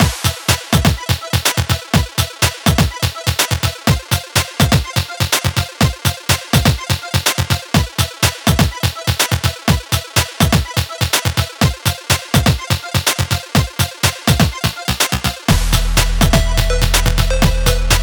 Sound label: Chiptune; Chiptune Music Pack Vol. 4